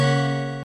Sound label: Minus Point